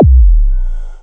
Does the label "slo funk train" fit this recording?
No